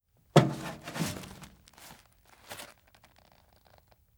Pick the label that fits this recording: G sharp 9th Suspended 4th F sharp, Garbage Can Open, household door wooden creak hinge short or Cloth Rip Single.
Garbage Can Open